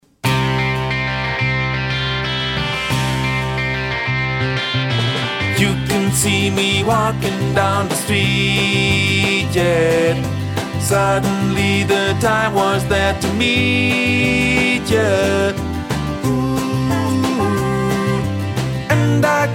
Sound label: Tenor